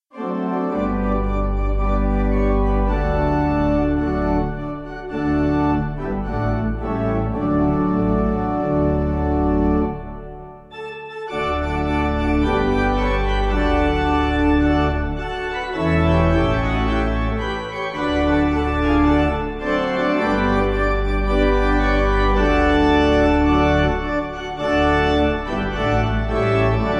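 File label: pipe organ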